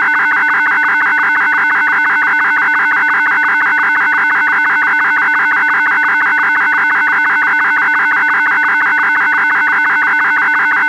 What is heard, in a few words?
radiocom2000 cell broadcast